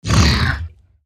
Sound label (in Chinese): 僵尸疣猪兽受伤声